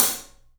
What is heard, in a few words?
Studio Hats, 3D Percussives; E-MU Producer Series Vol. 5 – 3-D Audio Collection